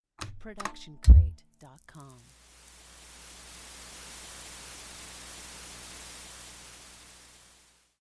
TV Turn On with CRT Tube Noise and Ground and White Noise; SoundsCrate